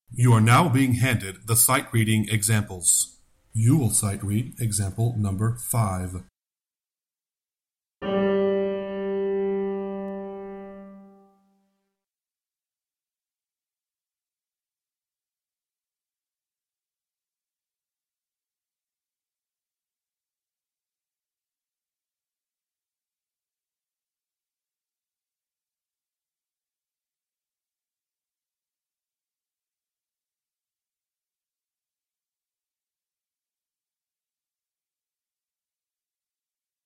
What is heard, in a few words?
Sightreading Example Bass